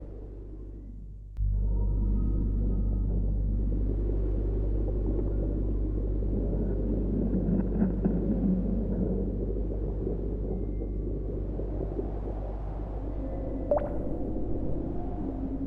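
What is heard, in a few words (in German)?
Mine Geräusche; Stardew Valley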